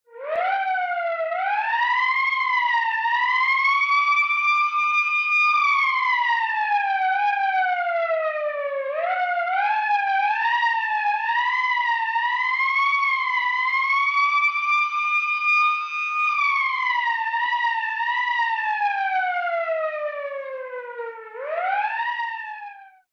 siren, Vehicles

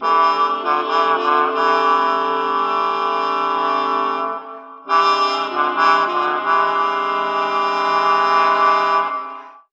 horns, jazz loops

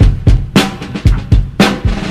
tappers, Funky Drum loops

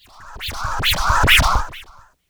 ahh

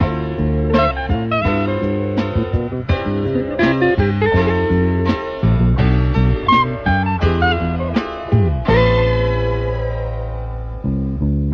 guitar, Phonky Loops

